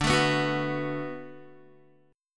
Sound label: D 6th D down Guitar, chord; D6 strummed; tuning 4F,4A,5C,5G,5C,6E